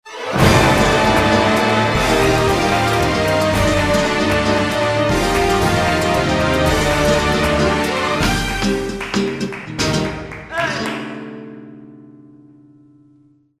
JEREZ FLAMENCO; Jeréz, Bodegas - 2006

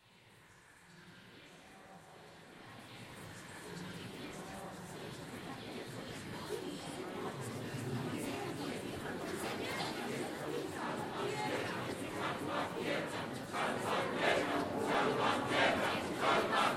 COROS MONSTRUOS; ISLAMAGICA

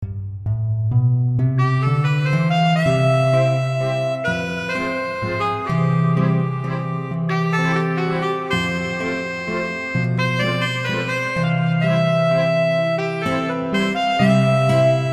Carrusel

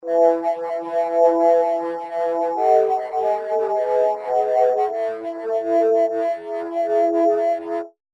voc organ hammond; Organo

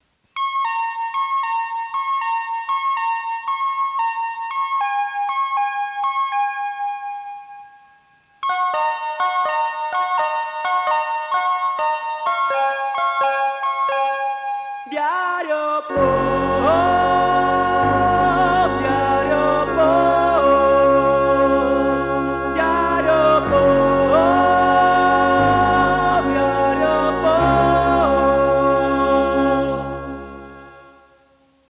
CORTINILLA; DIARIO POP RNE; Cortinilla 1 emitida

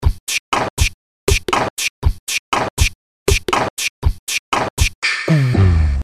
voc batera

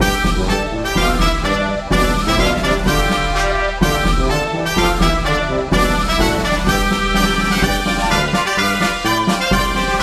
Circo

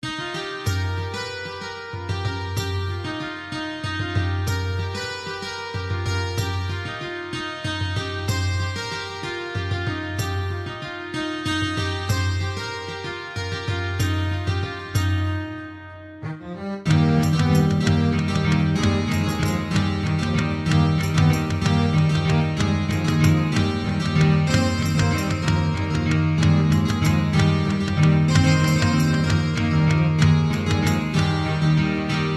DANZA BIENVENIDA; FTR Merida; Medea; TEATRO

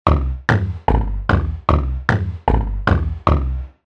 voc bass

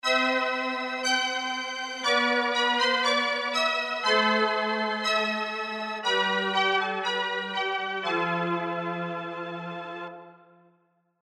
voc cuerdas; String

